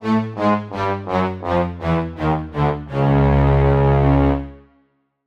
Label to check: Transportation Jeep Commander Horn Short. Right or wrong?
Wrong